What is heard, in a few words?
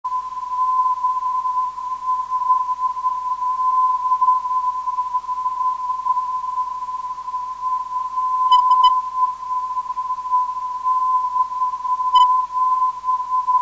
397 kHz, ndb